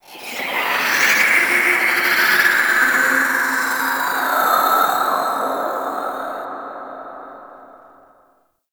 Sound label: Golden Maw Ambient Whispers; Warframe; The War Within